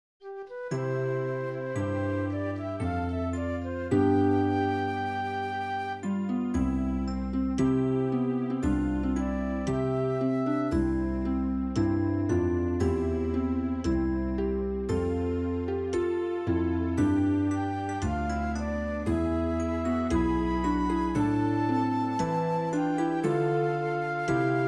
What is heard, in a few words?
instrumental